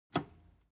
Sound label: metal button robust push enable